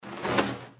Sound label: deathclaw swing; npc